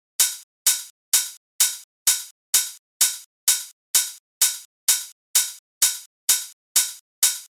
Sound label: HiHat